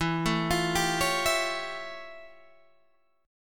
F Augmented Major 9th E

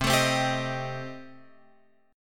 C Minor 6th Guitar Open F, chord; Cm6 strummed; JGuitar

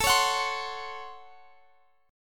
Db Major 7th Suspended 4th Sharp 5th A

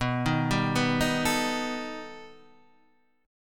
B Augmented 7th B; B+7 Chord {7 6 5 4 4 5} arpeggiated

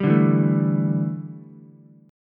A 7th Suspended 4th, A, down, Ukulele; A7sus4; strummed; JGuitar

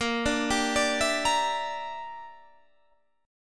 E Minor 7th Flat 5th A sharp

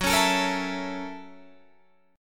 Gb 7th Sharp 9th Gb Guitar Open D

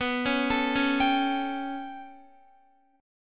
B 7th Suspended 2nd Sharp 5th down Guitar, chord; B7sus2#5 arpeggiated

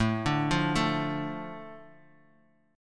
A Suspended 4th Sharp 5th down, chord; Asus4#5; arpeggiated; JGuitar

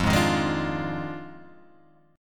F Minor 13th, Guitar Open G

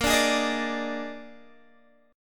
Bb 11th, Guitar, DADGAD

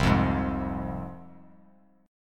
C Suspended 4th Sharp 5th, C, down, chord; Csus4#5 strummed; JGuitar

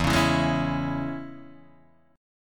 E 6th, down, Guitar, Open D

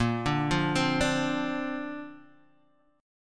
D-Minor 7th Sharp 5th, A sharp

